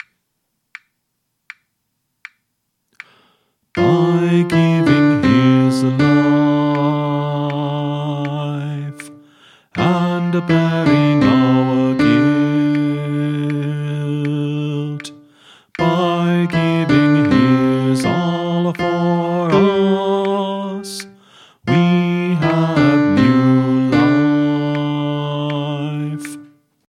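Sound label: Song, Choir Practice Tracks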